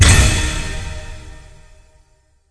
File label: scatter hit; football